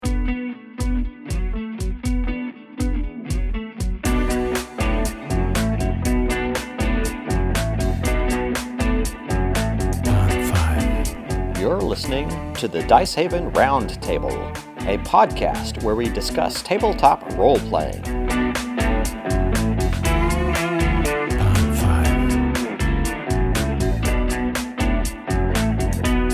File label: intro groove; Dicehaven